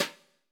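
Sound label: brush snare; Greytsounds; Scott Peers Sound Engineering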